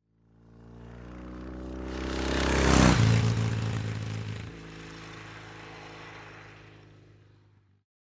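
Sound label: Motorcycle Norton Accelerate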